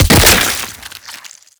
antlion body burst, damage

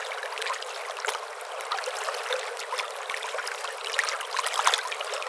light waves lp, ambient atmosphere

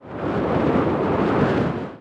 wind

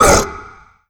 vox abandon grunt, zombie

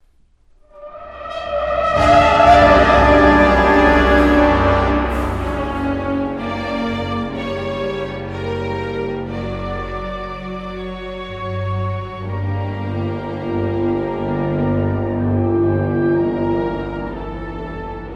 distillery hello, music

